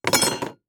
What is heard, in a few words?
Industry Tool Crowbar Place In Tool Box